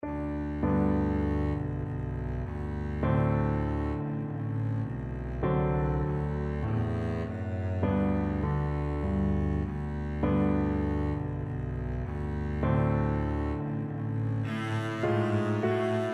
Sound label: America, double bass